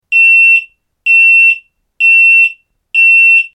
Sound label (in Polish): ton